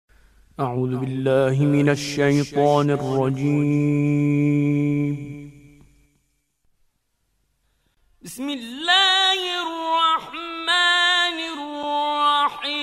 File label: Mujawwad; Mansouri